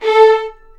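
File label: A4 marcato double bass, Arco; East West; The Ultimate String Collection; VLNS ARCO DB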